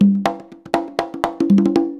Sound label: 120 CONGAS, Percussion Loops